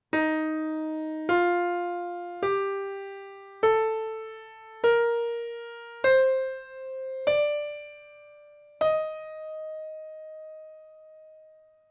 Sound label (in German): Tonleitern hoeren